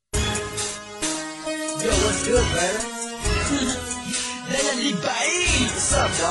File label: rap; viet rap